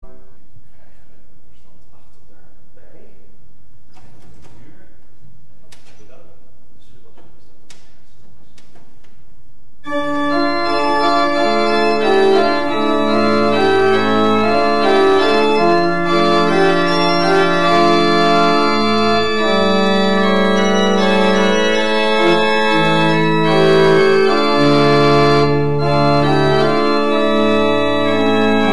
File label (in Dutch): orgel, Holpijp 8', Prestant 4', Quint 3', Mixtuur 3-4 (Pedaal: Subbas 16', Koppel Rugwerk-Pedaal)